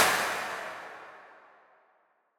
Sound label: A Very Clap